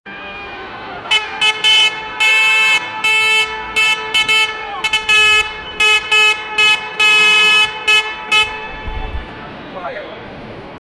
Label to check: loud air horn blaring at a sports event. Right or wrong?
Right